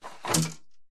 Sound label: household living room lounge horizontal wooden blinds close shut